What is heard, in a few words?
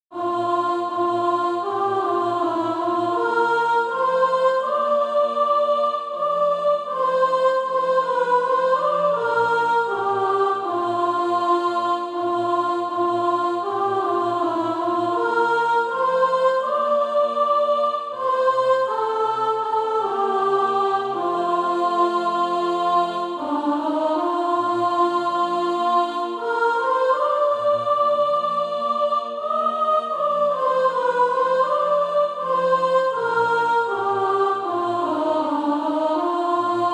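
soprano, Practise Music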